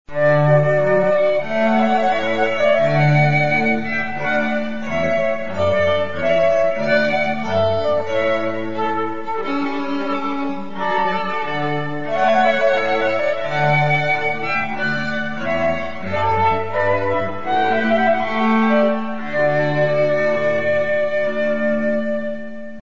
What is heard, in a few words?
trumpet voluntary; Purcell